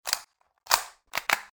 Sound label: s g17 reload, weapons